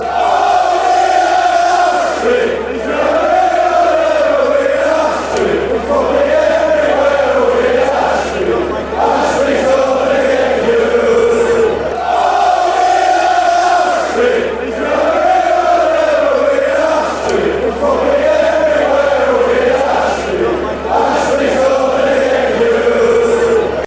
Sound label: oh, chants; ian ashbee